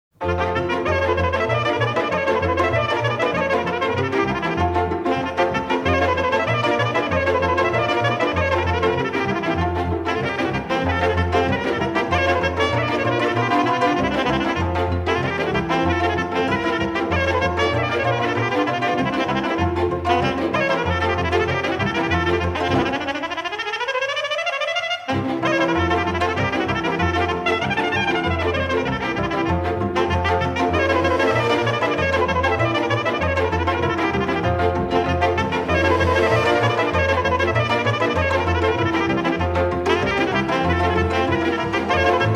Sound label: taragot, folk orchestra, rumanian folk music